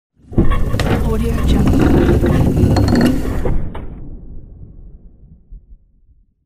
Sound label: tomb opening